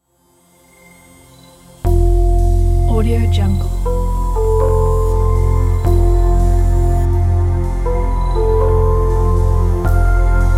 mysterious logo; myth

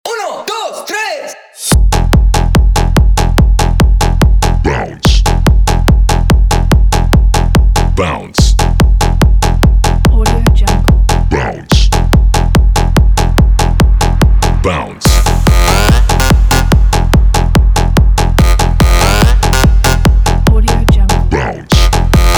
melbourne bounce parody; redoctopus

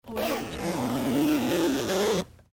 jacket zip down

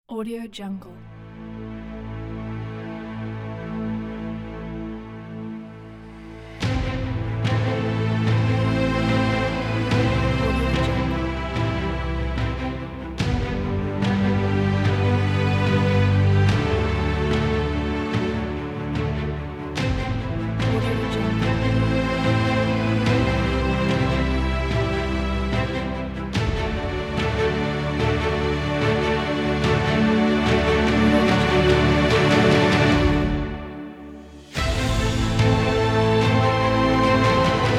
heroic

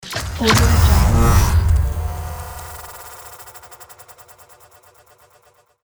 glitch intro

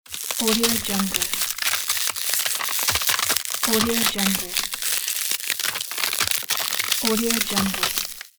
ice crackling

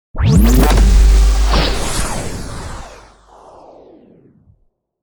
radio transition imaging fx; soundwood

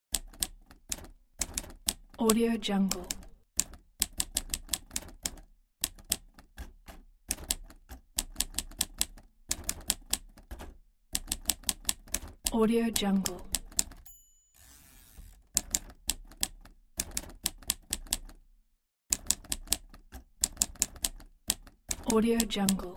old typewriter

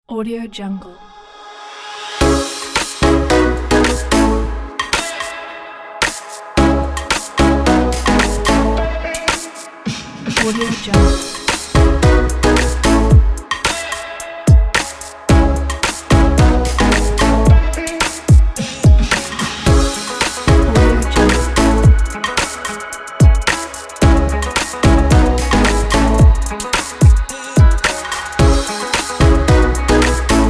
fresh urban advertising

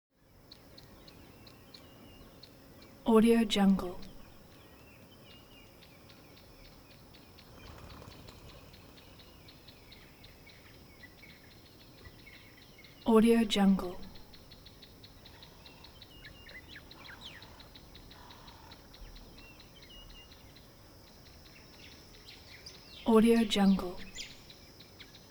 atmo bush water hole dusk birds insects calm; smartsoundfx

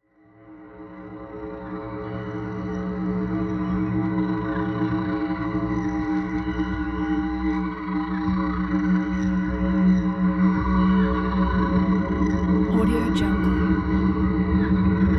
cinematic soundscape

